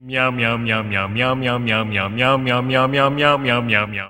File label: meow mix ringtone